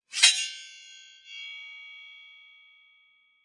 Cartoon Metal Chime, Sword, knife or metal bar slide; Comedy/Cartoon; Sword, knife or metal bar slide and tingle; Funny Sounds; Lynne Music